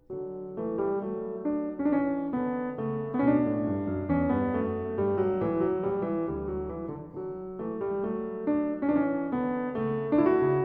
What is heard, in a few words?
French Overture in h-moll - Gavotte, Keyboard; IV. Gavotte II; Bach; Keyboard Works; BWV 831